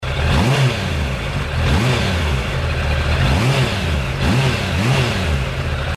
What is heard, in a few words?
bandit 650, suzuki